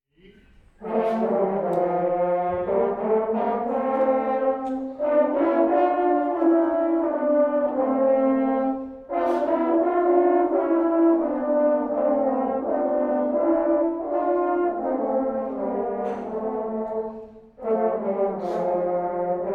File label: French Horn; The First Noel